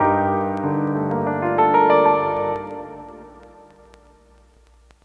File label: Loop